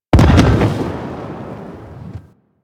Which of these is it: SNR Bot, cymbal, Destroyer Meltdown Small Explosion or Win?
Destroyer Meltdown Small Explosion